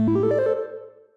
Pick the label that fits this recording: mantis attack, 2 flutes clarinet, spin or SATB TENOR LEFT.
spin